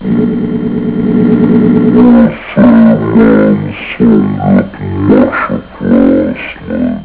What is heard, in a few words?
blurp; OctaMED SoundStudio V1